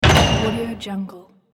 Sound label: closing metal door